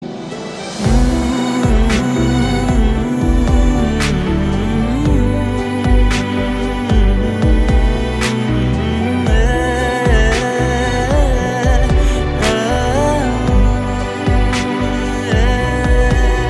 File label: Humming Tone